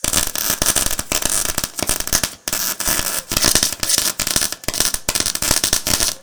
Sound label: Electrical Welding